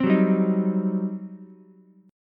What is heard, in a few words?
G Major 7th Suspended 4th Sharp 5th, G, down, Ukulele; GM7sus4#5 strummed